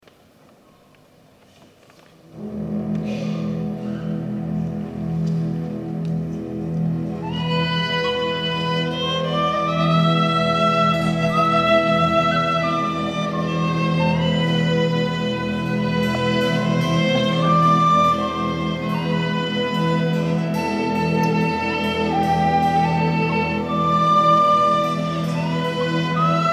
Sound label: An Irish Lullaby